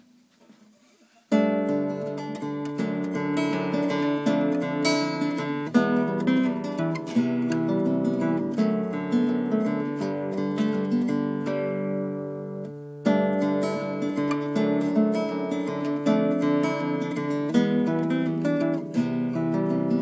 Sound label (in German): Gitarre h moll; neuer hmoll-Teil